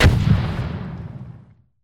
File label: explosion